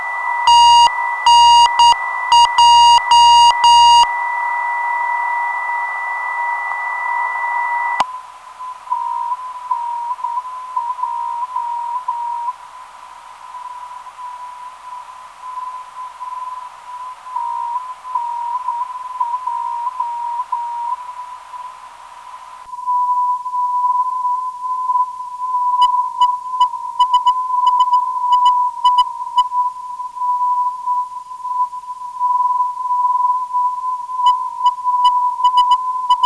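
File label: non-directional beacon; GEN; 318kHz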